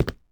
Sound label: tile, Footsteps